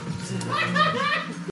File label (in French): rire